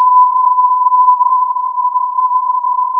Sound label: filtered input